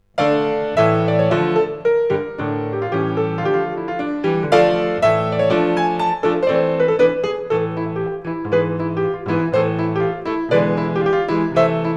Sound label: piano; Scarlatti; Sonates (édition Ricordi); K64